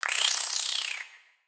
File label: Spider Idle; creatures mechanical; Lego